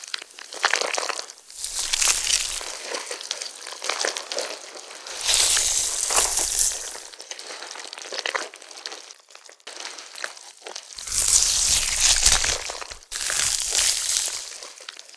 worm idle loop

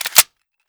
mag in, weapons; cw deagle

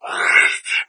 chatter, hazmat zombie; doom3

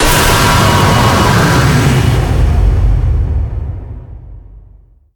soul stream fire

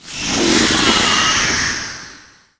spider monster threat

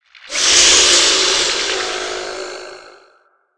spider misc

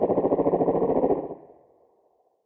fog shooting

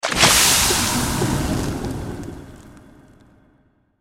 poison stinger

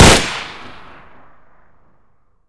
double tap, an94

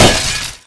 target bust

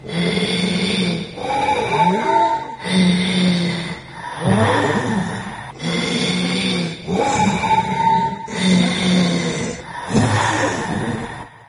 idle solo; doom3

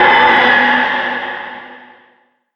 sword transform

